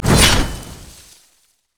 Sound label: bushwhack hit; nidalee base